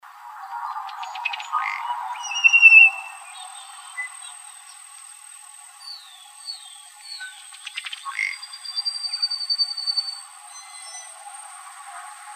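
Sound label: Ruddy Duck, Ducks Geese Swans; RUDU; AZFO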